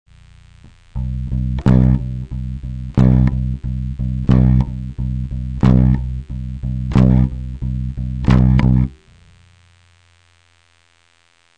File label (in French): accent, bass; main droite